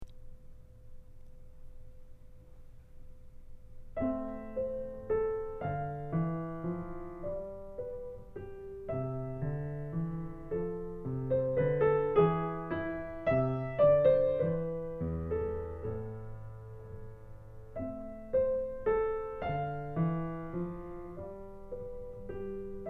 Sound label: Menuett